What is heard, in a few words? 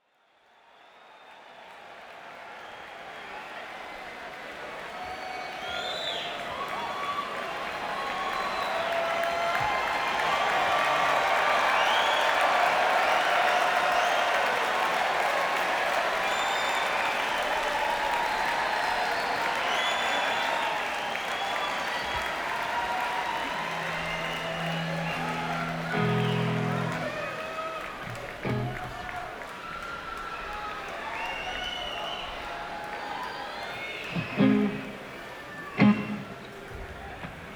Crowd; Bethel, NY - Bethel Woods Center for the Arts